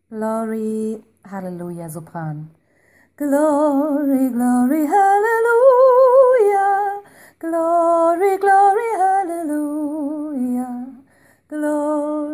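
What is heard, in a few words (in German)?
Glory hallelujah sopran, gospel choir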